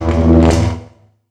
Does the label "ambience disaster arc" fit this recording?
Yes